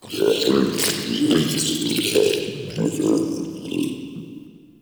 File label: Interior Munching, Infested, Ambience; Misc Indoor